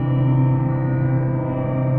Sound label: Deep Background, Dark Textures; Blender